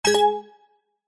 game tone synth bright organ style short stab alert